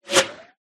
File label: warfare medieval fire arrow fire whizz past fast